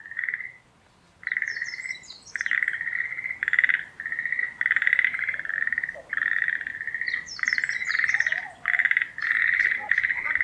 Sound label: frog